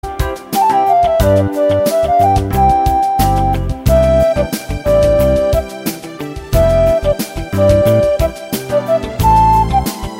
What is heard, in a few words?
Banssi Music Ringtones